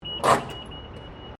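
Trolley Motorized Luggage Train Station Handbrake, Electric luggage trolley; Carriage; Carriages; Vehicles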